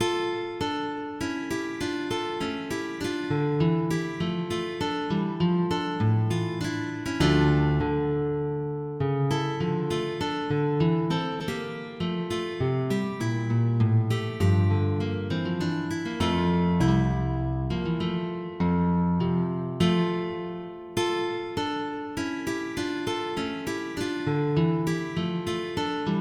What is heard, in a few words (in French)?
Le Canon Duet